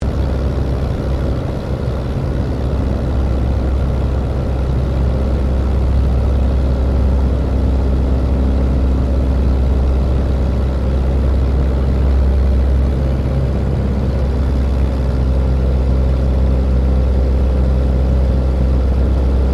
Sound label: AIRCRAFT DH82A DEHAVILAND TIGERMOTH FLYING INTERIOR; RAAF Tigermoth; Airplanes (Sound Effects:Vehicles)